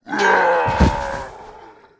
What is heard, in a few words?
orc dead, monster